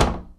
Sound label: door sample